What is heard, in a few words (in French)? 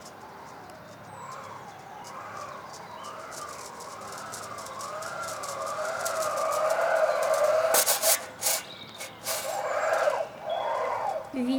skateparc; CREUTZWALD